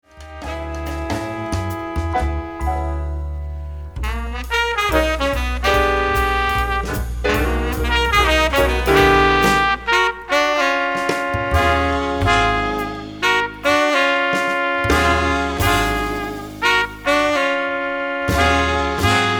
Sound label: bass